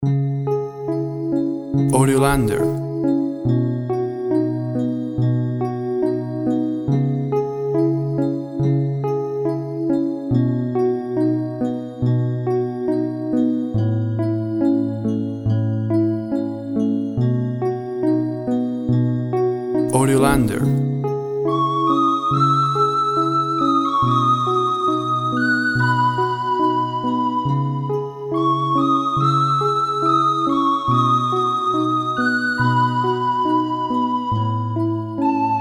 Ancient Plaint, Harp and flute play a wistful and ha